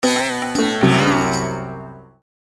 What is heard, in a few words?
lose; mario vs donkey kong tipping stars; 3ds